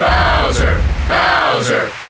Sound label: Cheer; Bowser; Melee